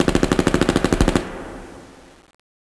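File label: machine gun burst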